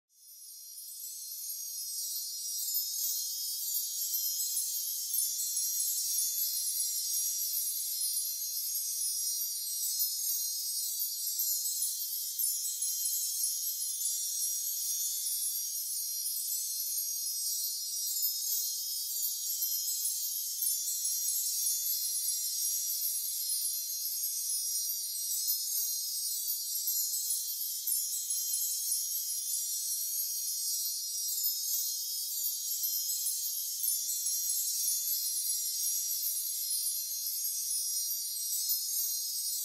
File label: Magic Glitter Fairy Dust Sound Effect